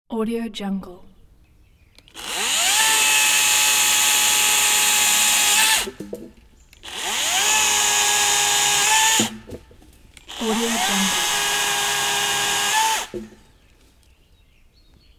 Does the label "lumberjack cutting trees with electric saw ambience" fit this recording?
Yes